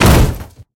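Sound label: sound of heavy fallen metal object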